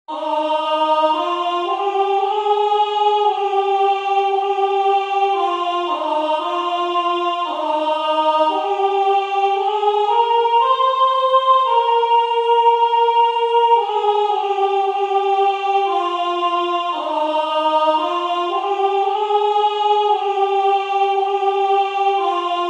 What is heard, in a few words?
Ave verum Soprano; SaintSaens